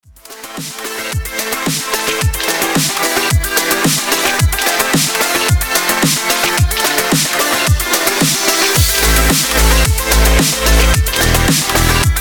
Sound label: Snare Wet